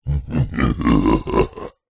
horror male low pitched evil laughter

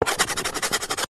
chalk stick thick scribble on small chalk board; office

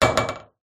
small metal pole place down on metal surface; foley

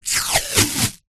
band aid plaster strip peel from roll

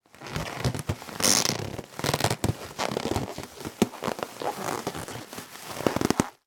movement of inflated thin plastic bag; foley

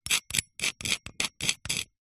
rock brick metal blunt scrapes writing engraving

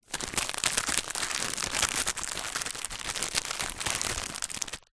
food biscuits packet movement handling

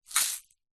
salt rock in plastic shaker tip movement

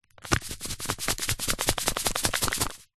household medicine laxative powder sachet shake

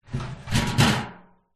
household trash can metal lid close clang

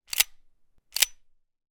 gun 1911; weapon; still north media

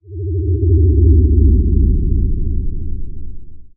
sound design dark warble

